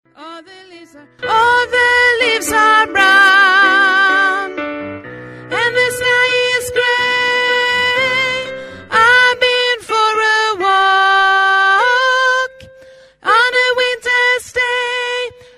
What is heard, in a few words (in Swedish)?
bas, stammor